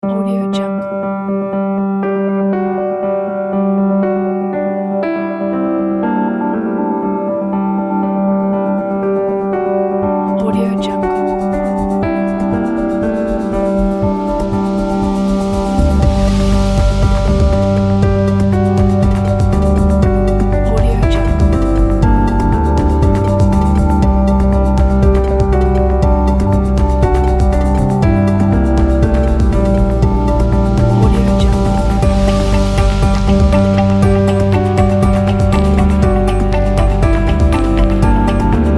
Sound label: ambient piano; stockboxmusic